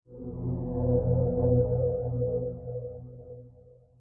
Portal; SuperOrxoBros HS18

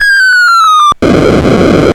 Rocket explosion

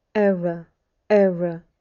Error

